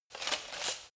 light walk fast single

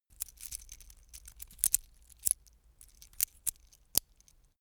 metal watchstrap rattle

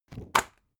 spring binder close hard

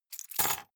key ring large place table

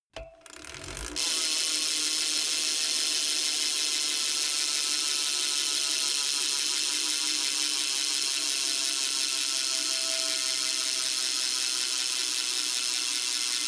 bmx bike freewheel coast long

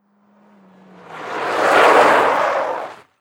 suzuki arrive and brake

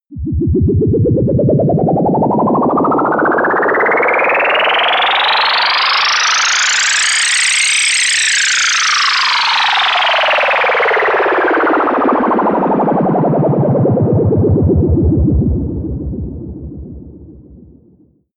fast ufo liftoff and landing

